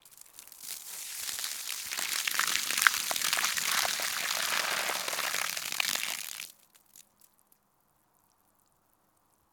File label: Garden Hose